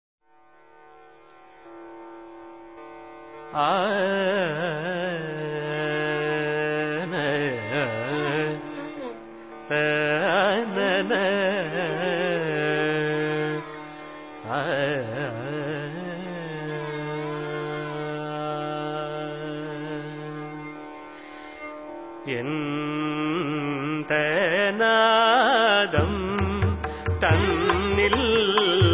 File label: Tamil Classic; RKC Live Stream; Indian Artists